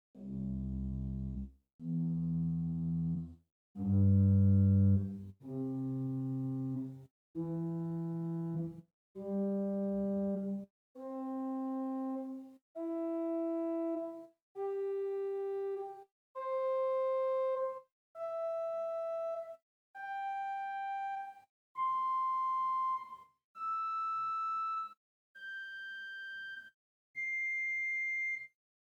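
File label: Swell Celeste 8 arpeggio, organ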